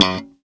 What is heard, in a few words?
gb3 staccato, glowly bass